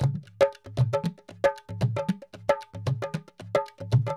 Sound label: Dholki; loops